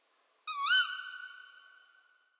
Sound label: owl hoot; owl hoot 3